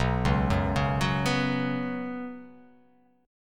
B Suspended 2nd chord; arpeggiated; JGuitar

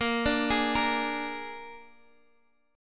Bb 7th Bb down 4G,5C,5E,5A

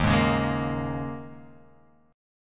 Eb 7th Suspended 4th, guitar, Open F; Eb7sus4; strummed; 3,3,1,3,1,x; JGuitar

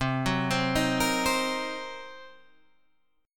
C Minor 7th Flat 5th, Guitar, Standard; arpeggiated; capo 3; 8,9,10,8,11,8

